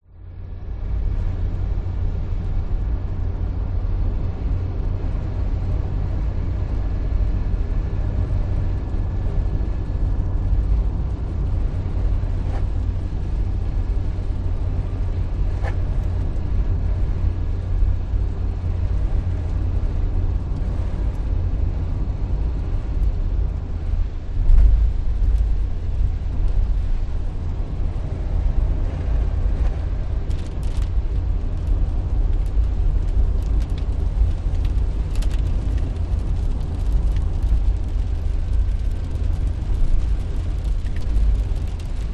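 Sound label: vehicles car drive 100kph wet road rain increasing interior pov; zapsplat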